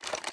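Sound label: inspect movement; Valorant; cstrike; bucky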